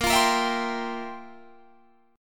F Major 7th Suspended 2nd Suspended 4th, A sharp, guitar